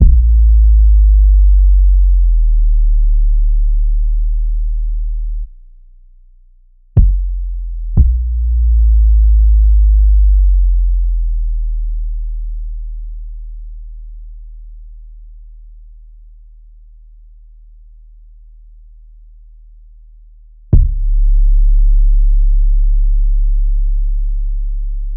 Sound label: movie trailer bass drop